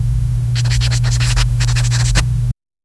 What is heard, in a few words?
a stroke of a marker